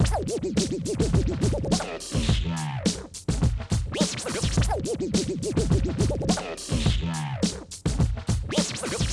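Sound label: Turntables, DJ Vinyl Record Crazy Scratch; Musical, Song & Phrase; Subway Surfers City; Android, iOS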